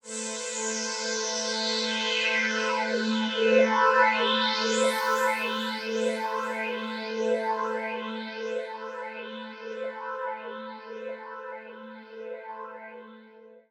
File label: SPACE PAD 140 A; SpaceSounds